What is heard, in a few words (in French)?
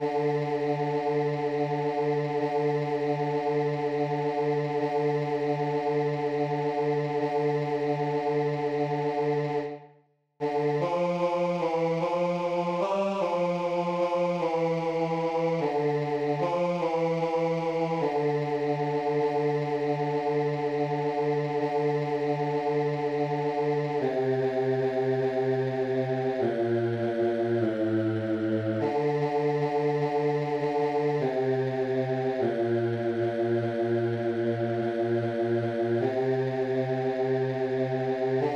basse; puer natus l'enfant nous est ne